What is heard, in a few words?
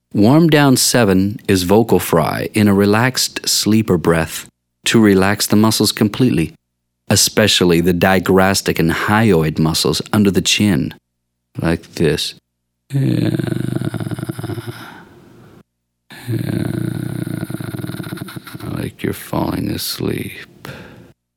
warmdown Vocal Fry males; singing lesson